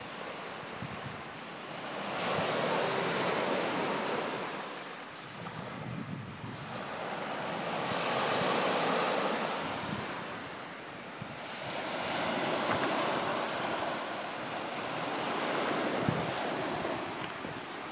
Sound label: sea